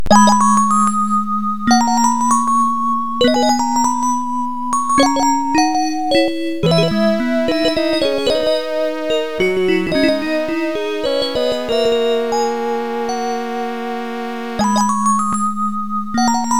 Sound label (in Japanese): オルゴール; Valis The Fantasm Soldier Collection III; Genesis; PC-88; SNES